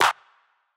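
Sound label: Clap G minor Analog Layered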